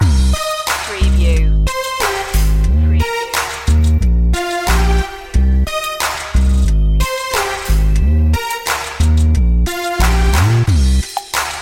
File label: trap it main loop